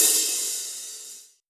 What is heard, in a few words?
Yamaha RM50 Open Hi Hat